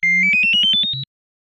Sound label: 90s Phone, ringtone; Retro